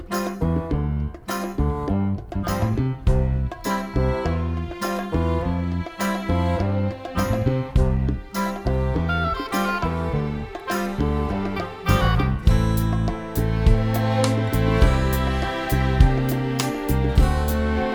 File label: Callback Backing